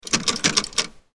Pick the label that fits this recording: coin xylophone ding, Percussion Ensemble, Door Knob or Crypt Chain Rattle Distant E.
Door Knob